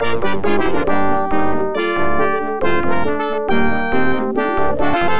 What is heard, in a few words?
Rebel Fighter Destroyed; star wars x wing; ibm pc at